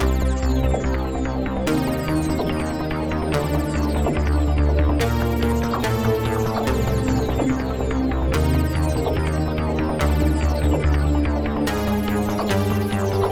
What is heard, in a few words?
Synth Pattern; Electrocution